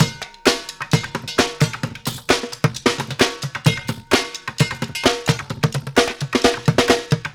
Street Groove, Ambient Grooves

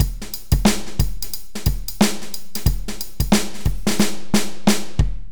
BPM 90+AMB; AKAI S-Series CD-ROM Sound Library VOL-4

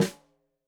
Snare Stereo, Drums; Deeply Sampled Drums

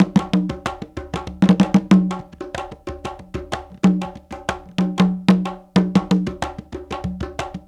Bembe 6/8, World Dance

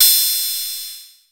KR77 Hat Op, Korg KPR77 Drums

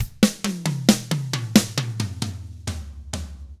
SLOW ROCK; 66SLOWROCK; Drums

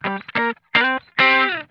GLIDE, Funk Guitar; Zero G - Funk Guitar

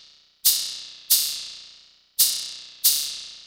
SHAKER, Techno 138 BPM; Best Service ProSamples vol.54; AKAI

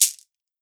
MIXED PERC; 90 s Sample CDs

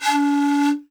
PIPE C#3, REED PIPE; Africa; Spectrasonics; Heart of Africa 1

